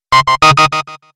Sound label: beep